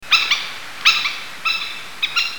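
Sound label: crested kingfisher; yamasemi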